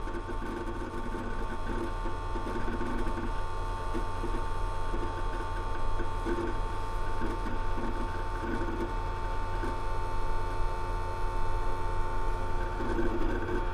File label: Computer Running In